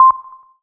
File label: Dance Mega Synth; Best Service; 90 s Sample CDs